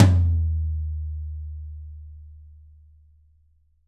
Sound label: YAM 14X14, Drums; Big Fish Audio; Ross Garfield Drums VOL-2; TUCSON KIT